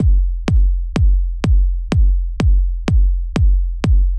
Kick même niveau mesuré que le Pad

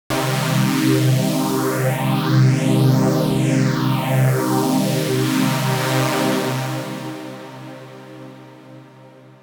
Son Pad même niveau mesuré que le Kick

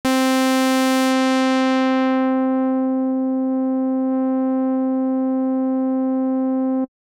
SAW 261 Hz filtrée jusquà un cut off de 1000 Hz

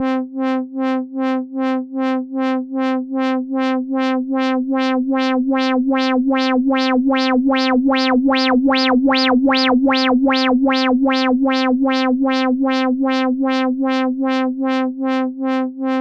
Sweep cut off LFO avec la résonance qui augmente à 100 et revient à 0

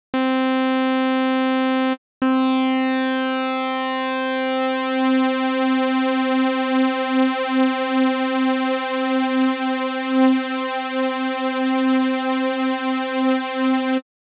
Effet de l'Unison

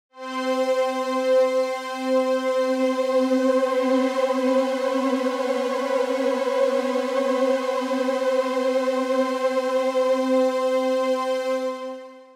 Effet de vibrato

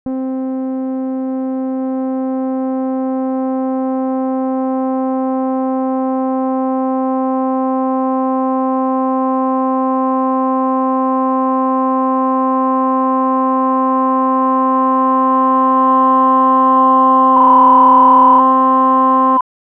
Effet d'augmentation de la résonance jusqu'à auto-oscillation

